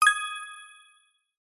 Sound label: multimedia game sound mobile game warm positive twinkle attention alert